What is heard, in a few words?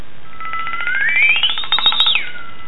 flexatone; odd instruments; toys